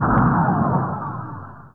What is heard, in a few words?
weapon fire